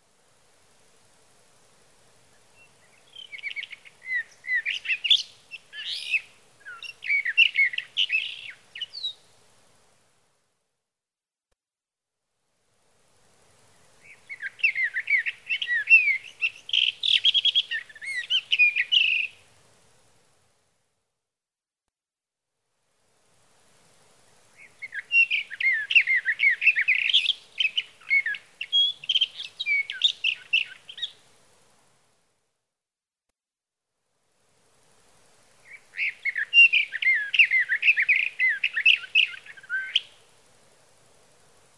Sage Thrasher; Sage thrasher songs, through the night.

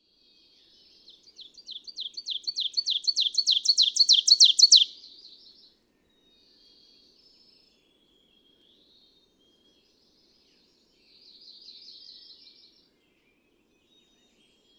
Ovenbird

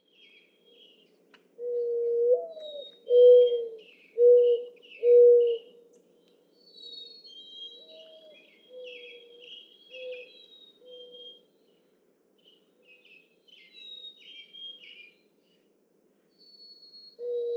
Mourning Dove

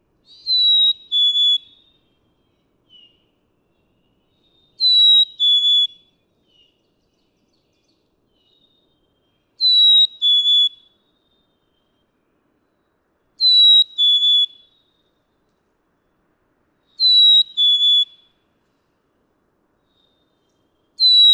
Black-capped Chickadee; Pitch-shifting, retaining melody—black-capped chickadee; Males can sing on one pitch for many songs in a row. May 7, 2017. Atlanta, Michigan.

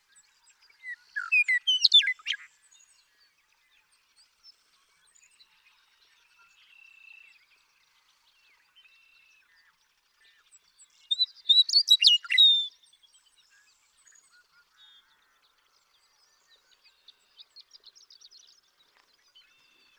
Western Meadowlark; The joy of western meadowlark songs.

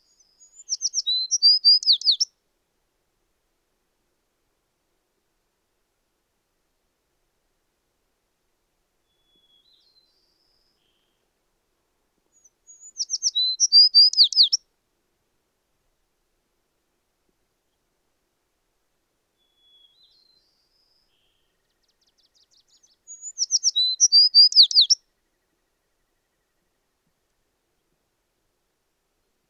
American Tree Sparrow

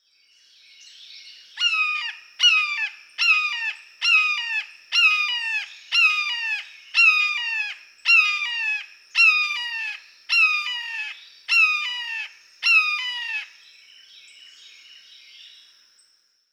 Blue Jay

